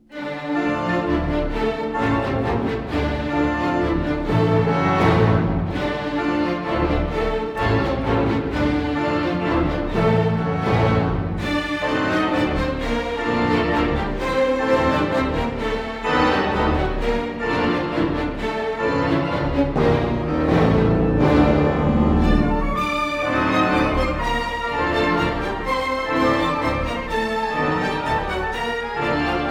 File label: Variation XIII Allegro, Organ Concerto